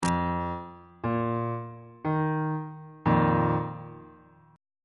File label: b flat suspended 4th 2nd inversion on bass clef